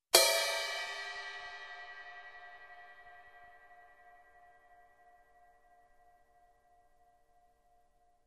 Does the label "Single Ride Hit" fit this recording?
Yes